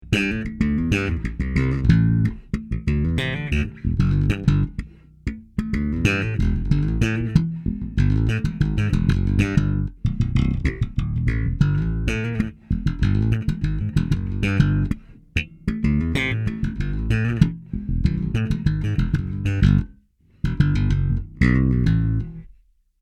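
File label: Woodblock Slap Style, bass; Masterbuilt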